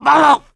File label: choke; survivor